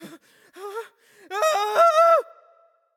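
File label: nonverbal fear; voicepack; smite; merlin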